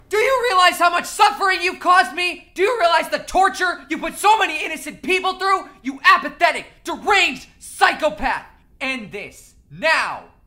suffering

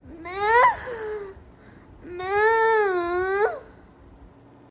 moo

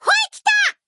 normal attack

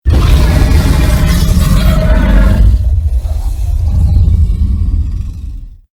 wither storm roar

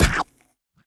enemy duck bite

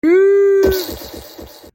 sheesh fart

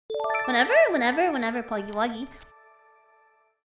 sub alert

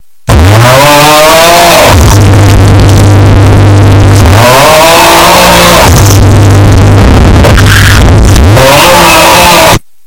aughhh earrape

